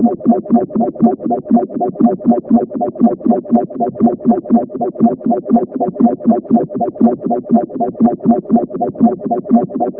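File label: binaural beats alpha theta; sleep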